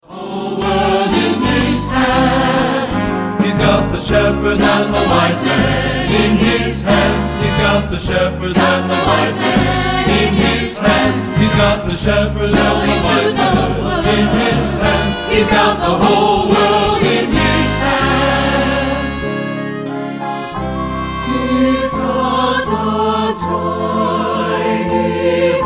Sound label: satb